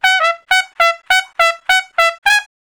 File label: Trumpet Straight (Db), Horncraft for R&B Solos